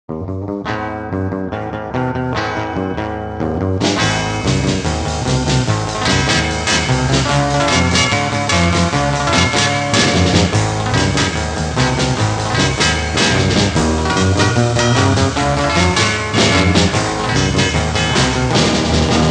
Instrumental

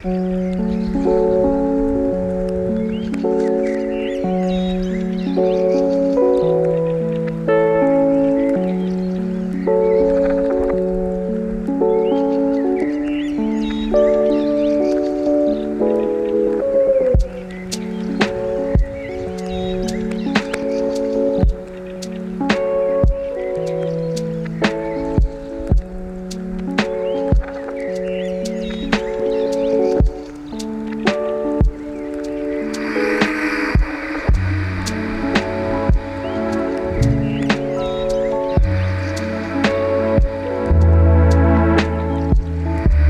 HIP-HOP LOFI Chillout Lounge Lofi

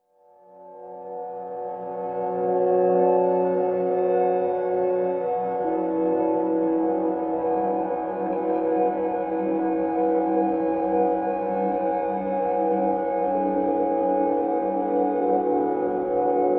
Healing Yoga Meditation Music

